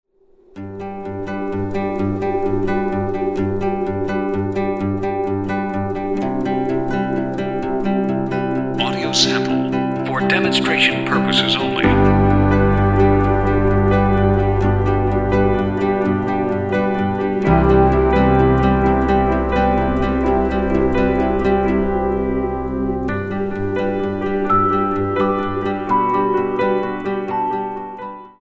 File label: FRIENDLY